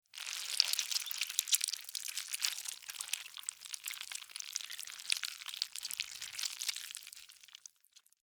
Larva Maggot Move, Larva, Maggot, Movement, Slimy, Insect, Bug; Larvae; Sound Effects:Animals:Insects; Blastwave